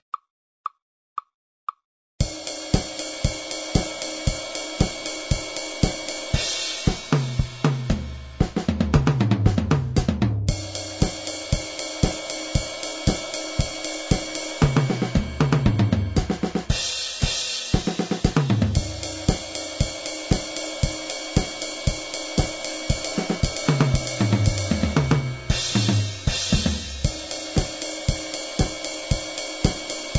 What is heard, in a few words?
Drumming 116BPM; Drumming Step by Step Bk2 Pg26